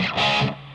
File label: chord